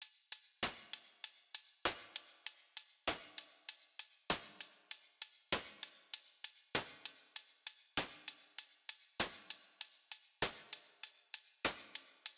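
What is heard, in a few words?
Eventide H9000 Plate Sticks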